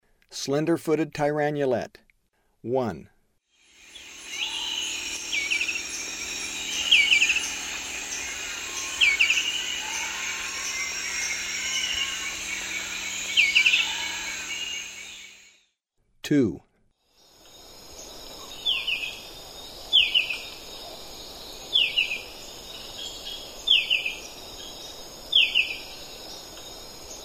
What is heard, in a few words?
Slender-footed Tyrannulet; S. America; Eastern Lowlands